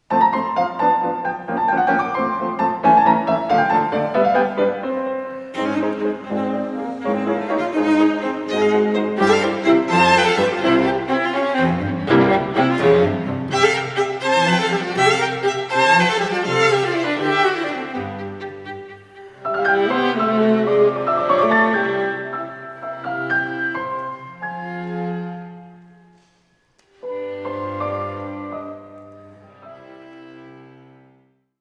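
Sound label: piano quartet; krogulski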